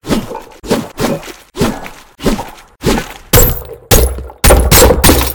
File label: snow globe sounds